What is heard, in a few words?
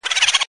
boo, reverse